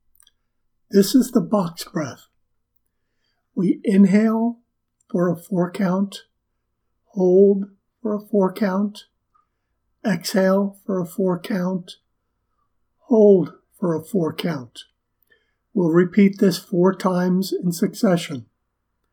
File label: Box Breath